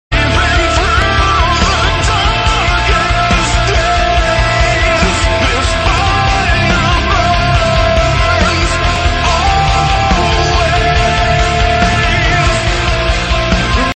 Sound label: rock ringtone; iphone 3g s